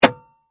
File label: transportation car cigarette lighter ping; Car Cigarette Lighter Pops Out Of Socket ( Ready For Use ); Cars; Vehicles; McKinney Sound